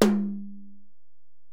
Tom, Alesis DM-PRO, Drum Machines, Toms

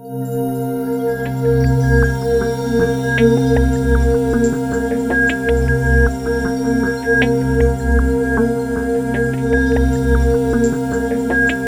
SEQ PAD, Ethereal Atmospheres; Soundscan - Ethereal Atmospheres

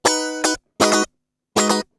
GUI 120 5aG#; Best Service Gigapack 2

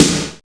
DARK GT SNR; Drums In Your FACE!

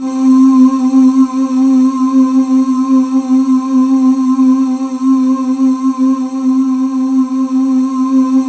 C4, Synth Leads

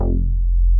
JX BEEF F2, BEEFY BS; Drums In Your FACE!

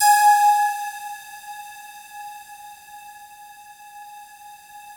FOG ATTACK, Yamaha VP-1; Music Store; 90's Sample CDs; FOGATTACK 2

